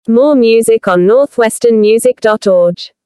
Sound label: Jingle; logos